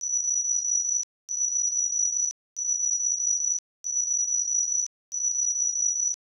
Scanner

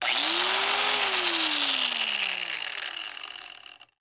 Roto Saw; Tools

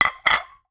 Saucers Stacking, Kitchen

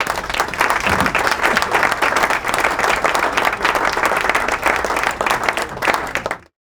Board Room Applause

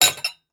Glasses Stacked, Kitchen

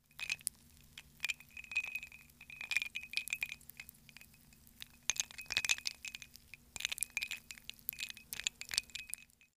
Glass with Ice, Kitchen

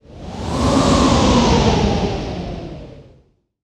Wind Howl; Horror Effects

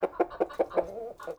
Chickens in Barn; Animals; 1001 Sound Effects

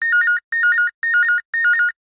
Telephone Ring; Communications

